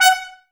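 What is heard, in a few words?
brass trumpets F#; Miroslav Vitous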